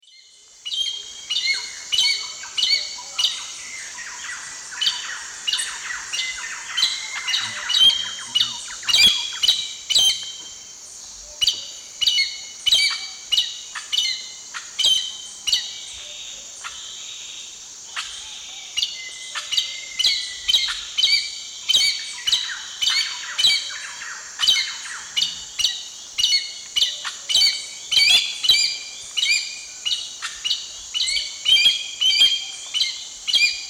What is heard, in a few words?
Pteroglossus pluricinctus, calls; S. America; Eastern Lowlands